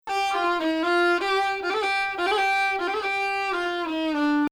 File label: Bayati Samai Bayati; Samai Bayati il-3ariyan; Bayati 1